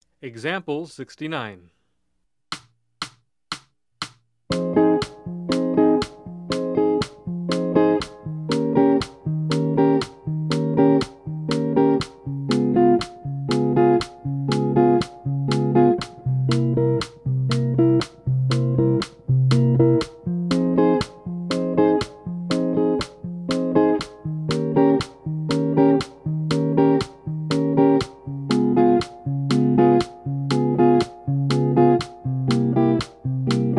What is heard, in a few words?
Jazz; jue shi ji ta